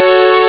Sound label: esq jump; Amiga Soundtracker Sample Packs